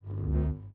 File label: lightsword swing swipes; soundscrate